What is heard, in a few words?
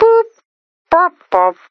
Robotic Sounds; Stonewood